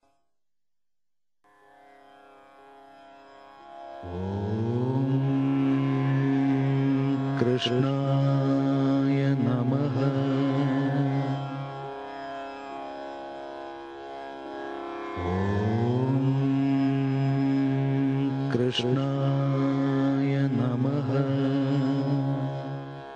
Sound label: Om Krishnaya Namaha Chant; Bhakti Sangeet